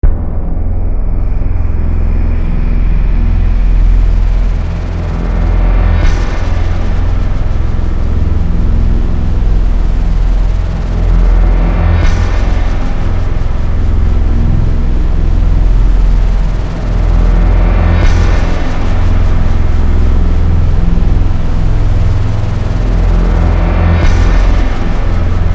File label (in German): schlaege